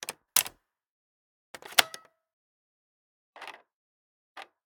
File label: laptop computer dvd tray open close; devices accessories utilities; NS Studios - ultra sound collection